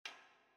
tunnel clap

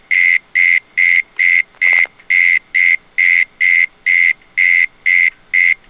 prarie mole cricket

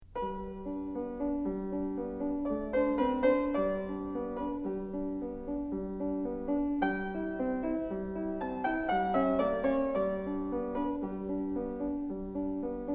early piano; Mozart

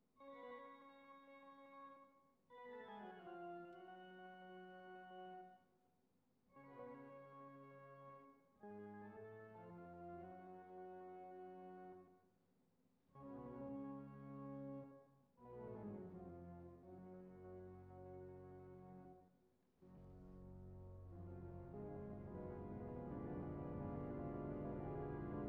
Organ; Titan

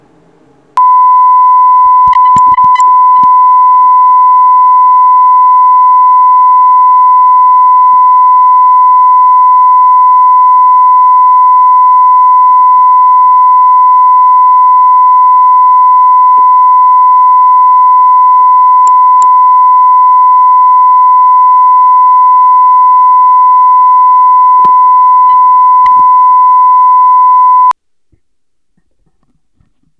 94dB Calibration

